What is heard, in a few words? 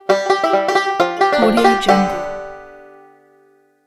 banjo fingerpicking